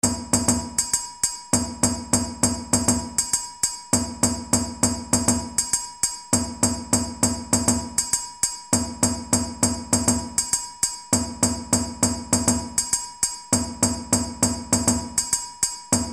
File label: KASSA Dundun